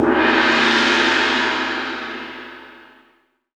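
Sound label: orchestra gong; Greytsounds VOL-1; ORCH PERC 2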